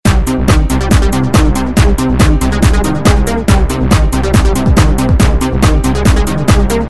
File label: synth